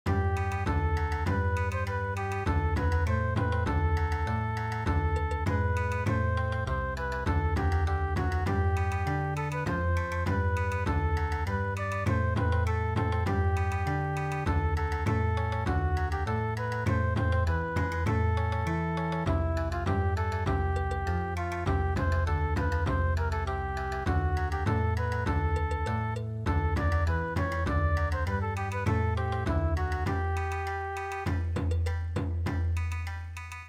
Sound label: Bon Odori, midi; Hearts ease